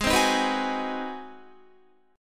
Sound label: G sharp 7th Sharp 9th, Guitar; G#7#9; strummed; JGuitar